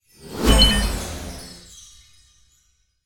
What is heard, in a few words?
Medal CUIVRE